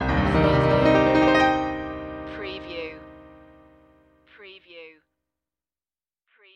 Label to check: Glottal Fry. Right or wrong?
Wrong